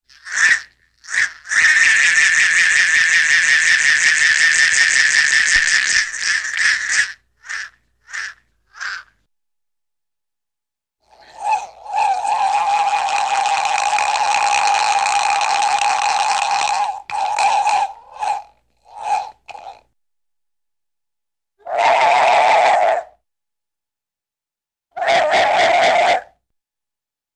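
Object Starts Spinning Rapid Whir with rattle, cartoon; Hollywood Edge; Cartoon Trax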